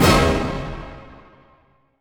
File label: Orch Stab